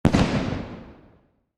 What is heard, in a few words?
Explosion Distant Cannon Fire Small; Fire and Explosions